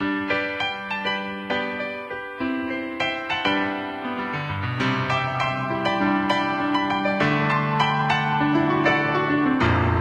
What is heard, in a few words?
Television; Whizzer